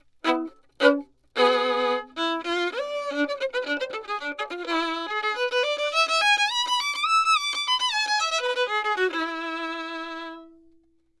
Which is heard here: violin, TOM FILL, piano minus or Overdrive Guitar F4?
violin